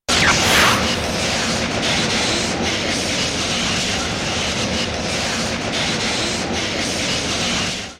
Ball Lightning; Storm Spirit

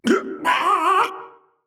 Warrior Death; Archangel; Realm Royale

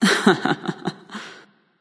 laugh; Fiend Hunter Artio

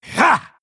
attack big; Pangolin

